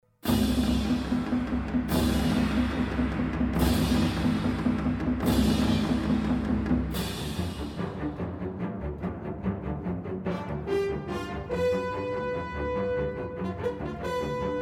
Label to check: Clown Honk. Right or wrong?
Wrong